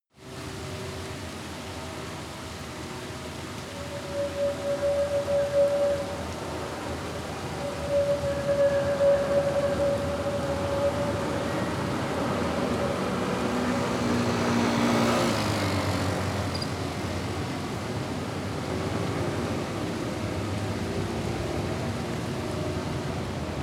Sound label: rain traffic church bells tram